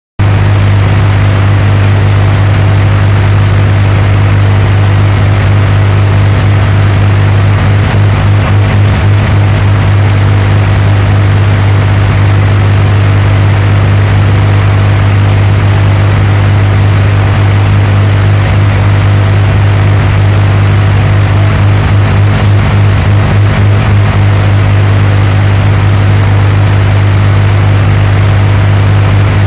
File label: Morning Noise Broadcast